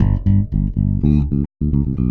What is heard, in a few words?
Electric Bass; Basses